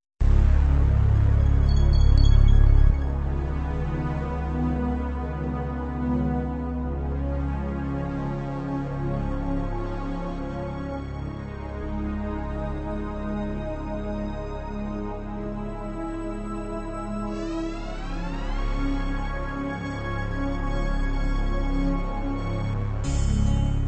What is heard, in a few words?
Cosmic Acoustic; loops